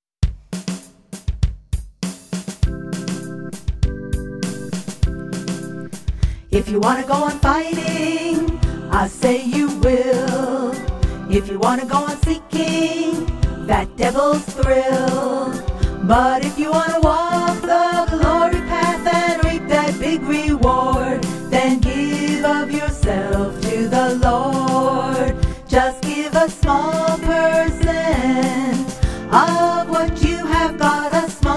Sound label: Prayer mix Music